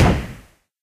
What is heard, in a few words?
flame thrower end; tf